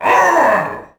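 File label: tiki attack; hawaii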